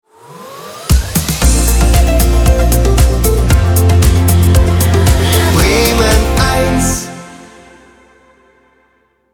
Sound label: Jingle Medium; Bremen Eins